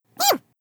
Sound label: cat attack